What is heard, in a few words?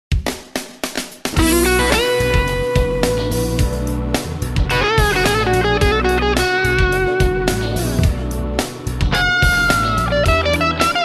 city limits drive; Tonerider